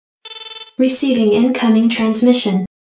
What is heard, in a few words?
Incoming Call